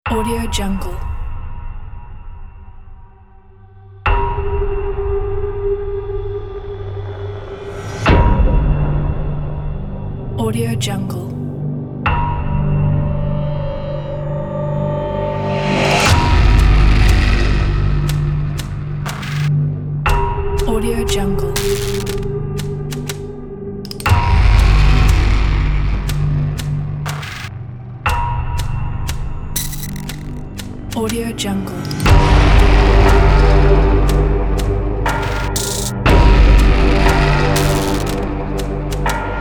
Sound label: dark trailer